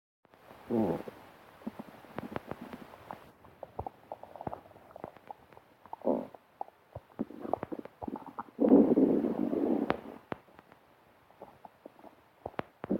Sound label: Bowel Sound; KittySensor